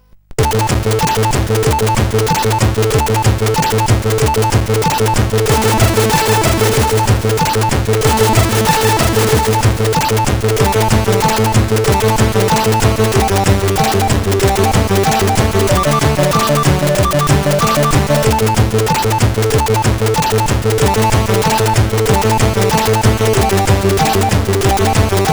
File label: Remix sid CSG8580R5